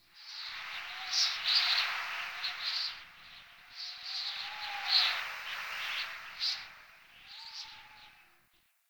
wind gust; Ambience; Warframe; Eidolon Plains

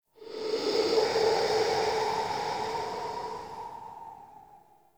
Ext Wind Gust Calm Toxic; Warframe; Infested Eris

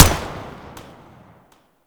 Viper Fire, Weapons; Warframe; Vipers

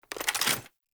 AK47 Reload Mag Raise; Tenno

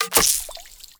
Pool Noodle Catch

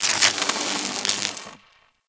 Spark, Ambience; Warframe; Corpus

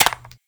Bird Three Single Step; Warframe; Entrati

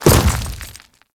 Step Dirt, Footsteps; Grineer

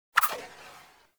Quick Draw Tail; weapons; Warframe; The New War; Omicrus

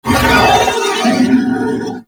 Horse Vocal Screech Jump; Vehicles; Warframe; Duviri

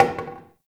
Single Wooden Impact; Ambience; Gameplay; Warframe; Nineteen Ninety Nine

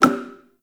Five Fates Result Two Kind; Cetus Vendors

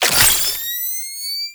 Laser Flare Activate; Warframe; Lasrian Soldiers; Abilities; Prone Lancers

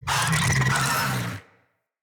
Infested Big Ashen Vocal Attack Death

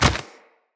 Root Spider Mother Step Dirt, Spiders

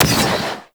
Cannon Fire; Warframe; Sentients; Sentient Eidolon Ghost Caster; Weapon

